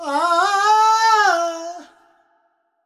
Voice, Vocals Male, World Pop

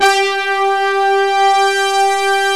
SYN BRS & CHOR

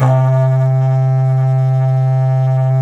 HORN, Didgeridoo and Other Primitive Instruments

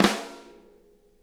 snare rim, drum kits

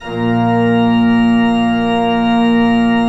ORG A#2, KEYS; Synclavier Sampler Library

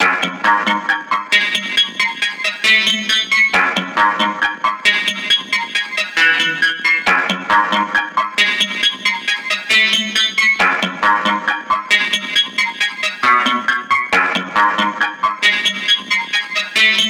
RESAMPLED MELODY

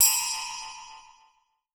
SPINNIN, METALLIC FX; Voice Crystal; Steve Reid Definitive Percussion

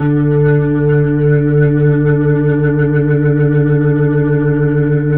HAMMOND 2D R; 3D Sound; 3D Keys VOL-1

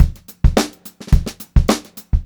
BEAT, Funk Groove Drumloops; USB Soundscan vol.38; AKAI

